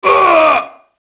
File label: merc death; Max Payne